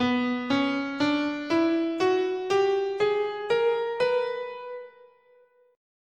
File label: B Bebop Melodic Minor, scale